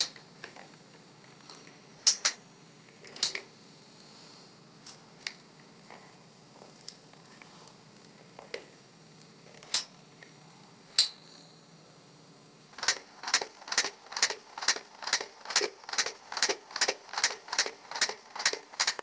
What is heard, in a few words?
crab claws tapping on metal surface; animals